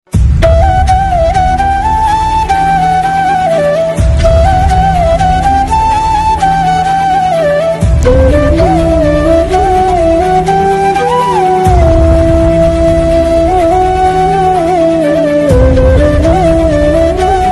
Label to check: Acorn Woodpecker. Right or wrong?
Wrong